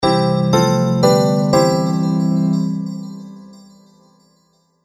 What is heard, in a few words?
Game Award Logo, Video Game Sound Effects